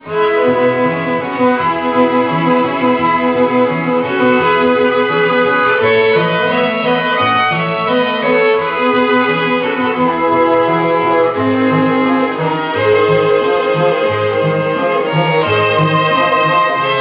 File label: string quartet